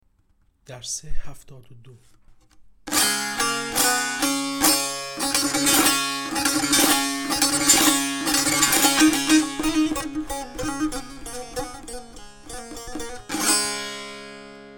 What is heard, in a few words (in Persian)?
مشق تنبور; کتاب هفتاد و دو مشق تنبور دوره مقدماتی